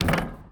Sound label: Street Ball Glass Back